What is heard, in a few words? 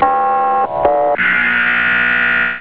buzzer; Emergency